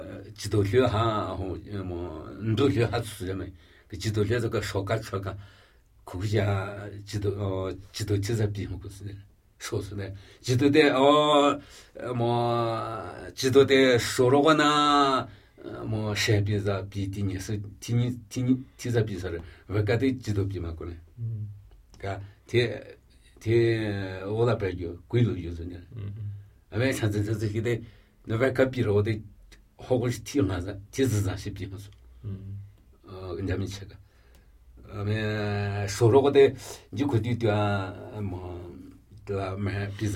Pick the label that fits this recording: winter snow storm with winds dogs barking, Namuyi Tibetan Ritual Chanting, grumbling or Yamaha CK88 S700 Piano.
Namuyi Tibetan Ritual Chanting